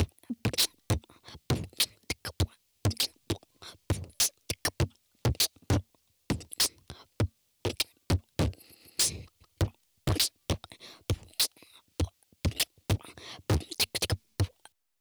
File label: bbox